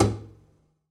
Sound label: pizz noise slap, double bass; dsmolken